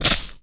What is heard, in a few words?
Web Stream Shot, Weapons